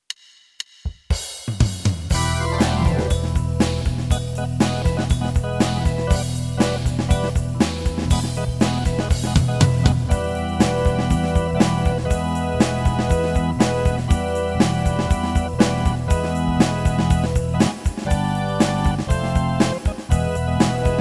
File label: electric